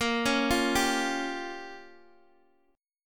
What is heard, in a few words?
A sharp Minor 6th, Guitar, DADGAD